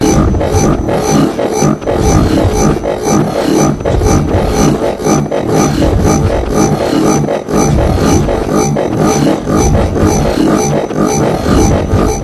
robotic power surges sci fi robotic robot cyber futuristic energy machine drone